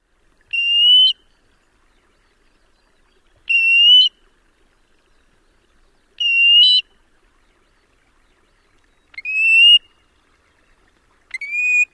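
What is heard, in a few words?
plu wee calls, Black-bellied Plover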